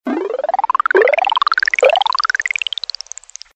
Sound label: nokia creole